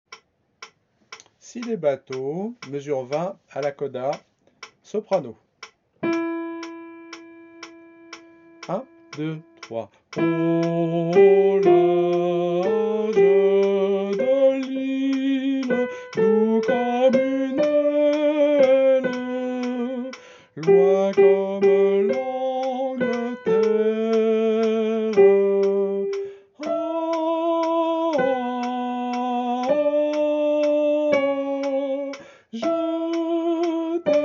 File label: coda soprano